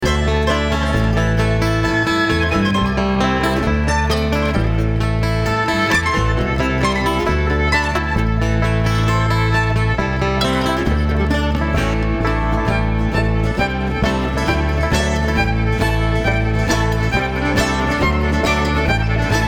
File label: Traffic Jam